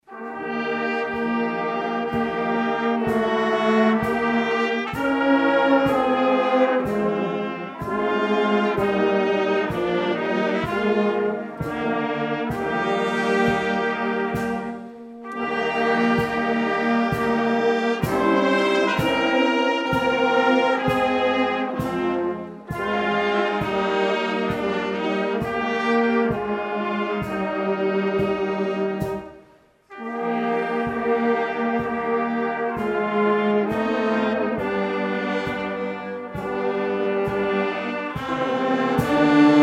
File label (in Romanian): Fanfara; Marti - Seara de Craciun